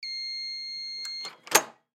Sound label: Hotel Room Front Door Beep Unlock; Miscellaneous Door Sound Effects; FOLEY